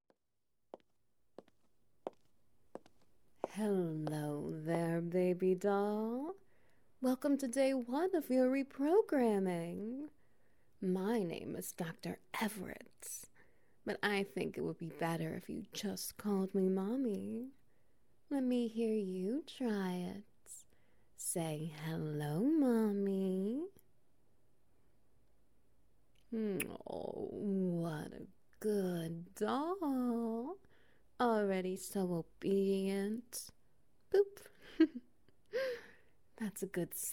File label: SFX Wet Noises; Babydollification